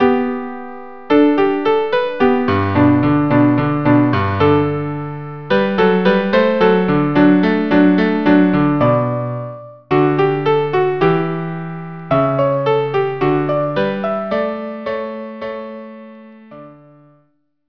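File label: violin cello duo andante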